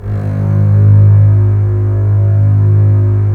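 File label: Strings contrabasses; Roland - LA Composer vol. 4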